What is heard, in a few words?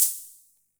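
Olson Cym B, cymbal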